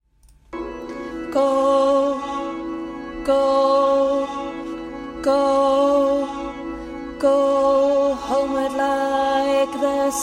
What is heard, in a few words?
alto